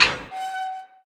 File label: open, iron door; iron trapdoor; Minecraft; Votive World